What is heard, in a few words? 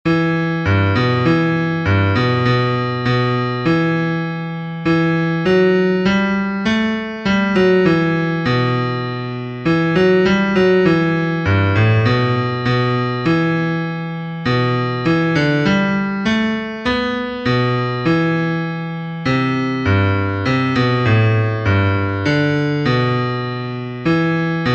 Bass